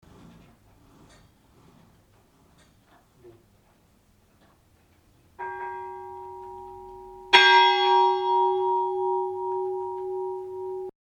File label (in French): cloche; Liernais